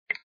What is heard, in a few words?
Button Appear; Shop Empire 2